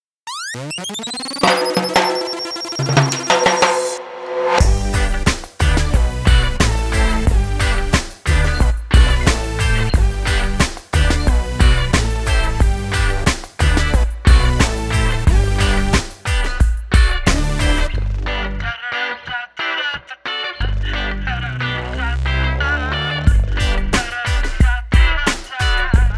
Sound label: Instrumental